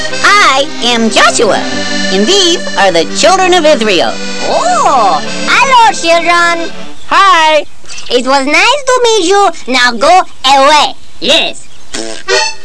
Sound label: children